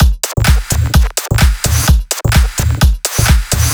drum loop 128 Full; Anthemic

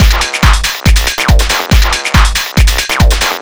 TECHNO BEATS 140

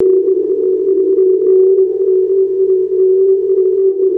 ATMO LOOP; ATHMOSPHERE

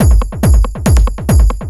hard beats 140, hard trance beats

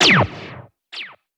SPACE FX 140; SpaceSounds

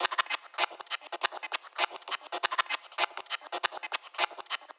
100 Weird Percussives, Percussion; Loops; Touch Loops; CosmicFunk

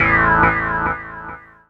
KEY SHOT TEKNO 140 A; Techno2; Singleshots

